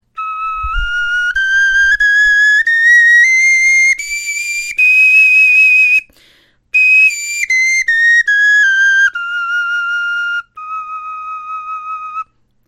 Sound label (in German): tonleiter, knochenfloete